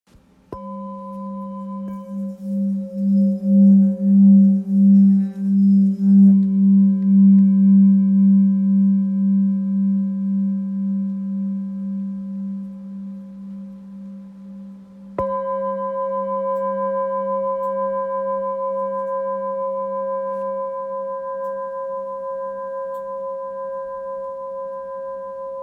Bol ancien sol

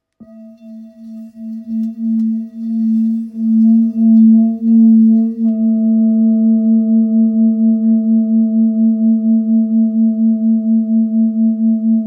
Bol full moon rose 2.23kg la

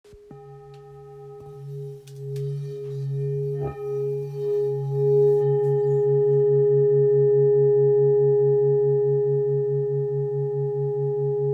Bol full moon grave om do

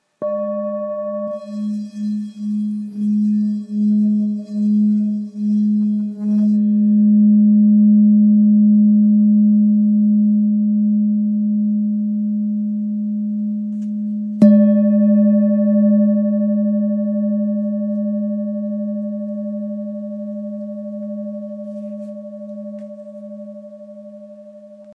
Bol ancien sol diese

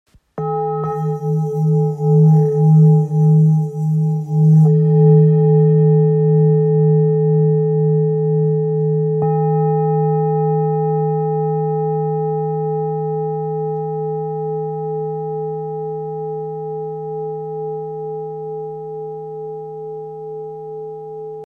Bol ancien la

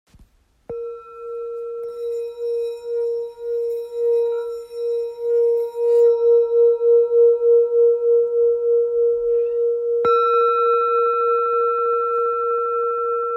Bol ancien si 325g

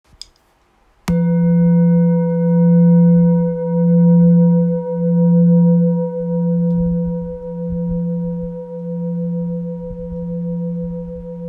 Bol thick 2.41kg fa

